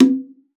BONGO, BONGOS; Xsample VOL-9

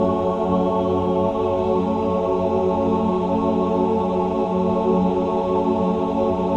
min chord, Symphony of Voices

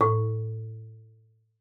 MARM A2, MARIMBA; Xsample VOL-4

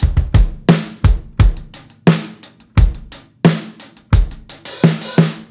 BUSY KICK, breaks, drums; Basement drums vol 2

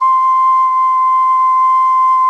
SOP C, GEMSHORN; SoundBytes - Early Patches

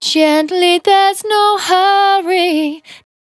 Finally 70bpm C, Velvet Vocal; Zero-G

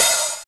Linn 9000, digital; Sound & Vision - Gigapack I

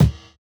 Kick, jungle breaks drum sounds

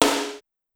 Snare, Clavia DDrum-AT, Pitched Up

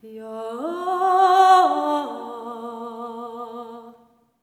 SERENE, Symphony of Voices; Spectrasonics

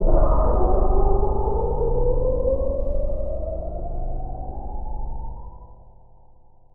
riser, Drum N Bass; Sonic Foundry (Sony Creative Software); Knutrix Drum & Bass

